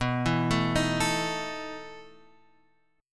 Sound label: E Major 7th Suspended 4th, B